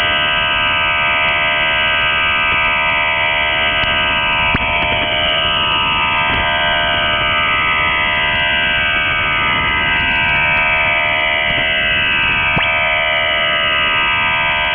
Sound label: Chinese MIL PRC4+4 8 x 75 Bd QPSK Traffic